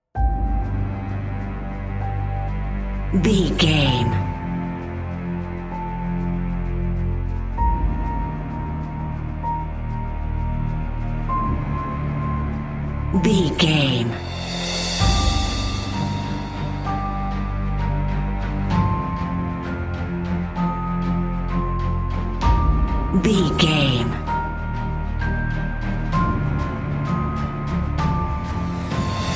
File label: epic cinematic bed